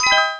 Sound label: curious down; Game Maker 8